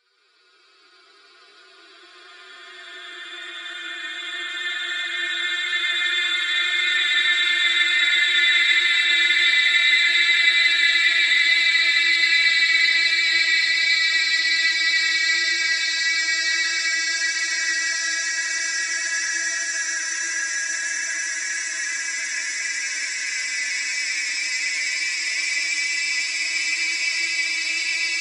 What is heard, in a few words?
Sample Lower Shimmer; SoundChaser; CerebralAudio